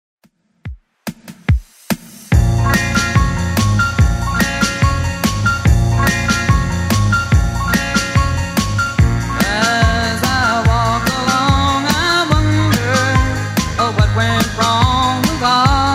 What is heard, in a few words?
60s, 144bpm; Redrum